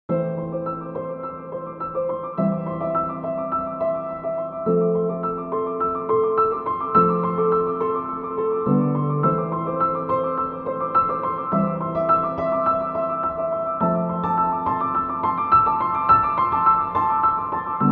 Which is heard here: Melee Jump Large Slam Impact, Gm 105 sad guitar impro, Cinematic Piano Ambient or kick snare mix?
Cinematic Piano Ambient